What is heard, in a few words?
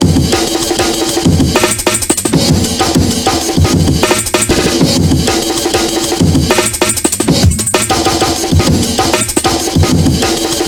break 194